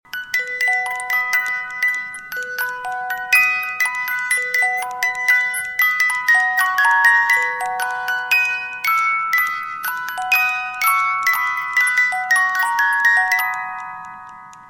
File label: music box alarm; ringtone; hollywood